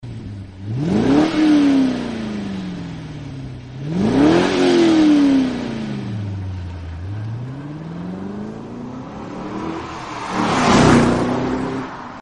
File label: magnaflow exhaust systems